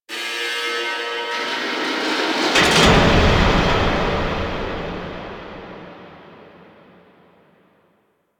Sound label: Memory Jail Door Slam; Warframe; Apostasy